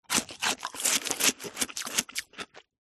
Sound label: food ice pop eat crunch; zapsplat